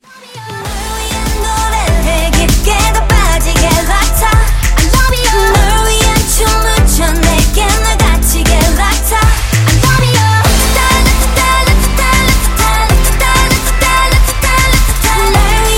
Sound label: chorus